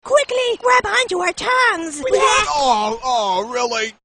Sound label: tongues